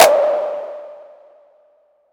long room reverb clap single hit D key; single hits one shots

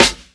smooth snare drum sound F sharp key

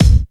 old school rich mids kick single hit c key

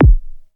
old school fat kick drum one shot a sharp key

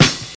natural hip hop acoustic snare a sharp key; snare drum samples

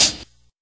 subtle pedal hi hat single hit D key; one shots; hat samples

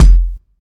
subtle reverb kick f key

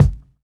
strong bottom end kick single shot f sharp key; kick drum samples

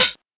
mellow closed high hat sample A key; hi-hat

